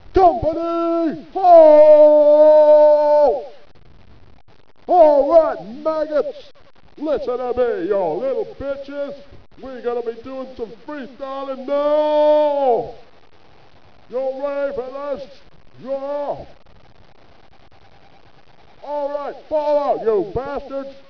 Courtyard

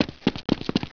footsteps

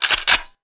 draw weapon

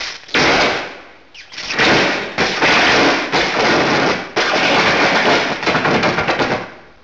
zapper

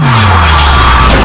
huge blast windup

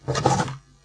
pick gun

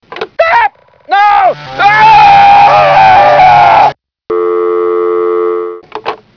telephone

